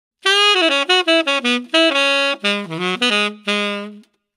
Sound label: saxophone; level 4